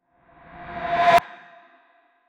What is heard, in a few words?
very short reverse reverb